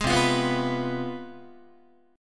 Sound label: C Major 7th Suspended 4th Sharp 5th, down, Guitar; CM7sus4#5 strummed; 11,8,8,9,9,10; JGuitar